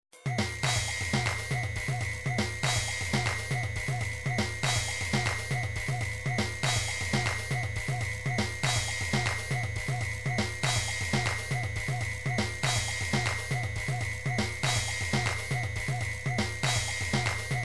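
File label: drums pattern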